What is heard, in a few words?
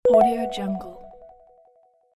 short melodic message